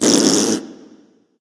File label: tongue; smite; vamana